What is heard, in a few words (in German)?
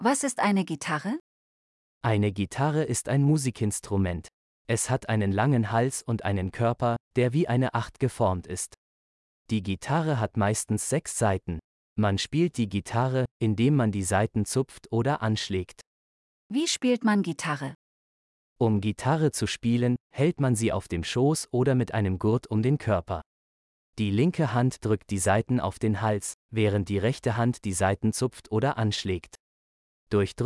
Gitarre